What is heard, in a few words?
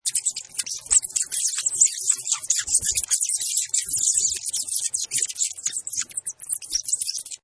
Bats Many Squeaking, Bats. Many bats squeaking, moving, flapping wings and flying.; Bat Sound Effects; Animals; Lynne Music